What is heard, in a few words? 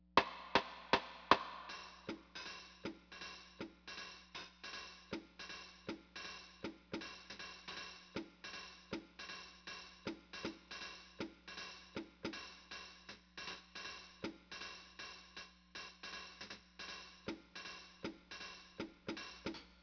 drums